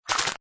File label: Scifi Production Element Noized Swipe; Suitable as science fiction film and game production